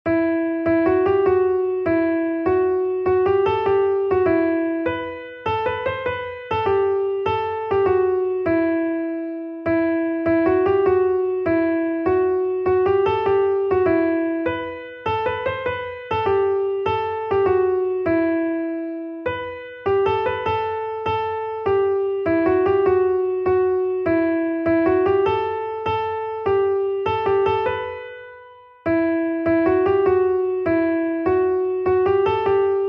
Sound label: melody; oh the deep deep love of jesus